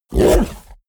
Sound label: fishman groan short; kreaturen